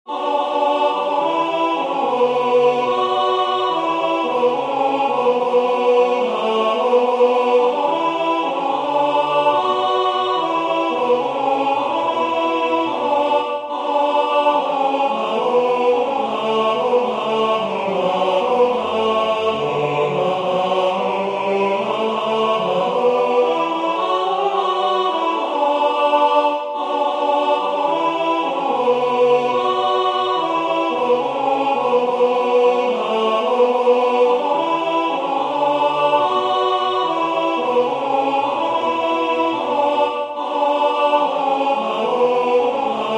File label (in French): Le Christ est ne ce soir tenor